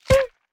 荆棘击打声

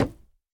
竹木破坏声

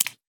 鱼扑腾声

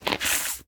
狐狸被激怒声

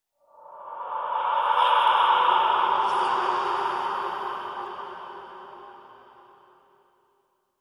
灵魂沙峡谷氛围声; ambient

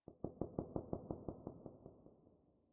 水下噼啪声; ambient

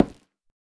下界木破坏声

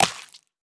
海豚进食声

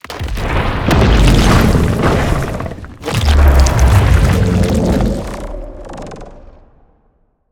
监守者钻出声

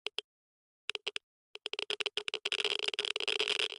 玄武岩三角洲咔嗒声; ambient

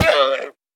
羊驼死亡声

